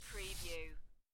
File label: Poker Chips Stack Of Chips Slide On Felt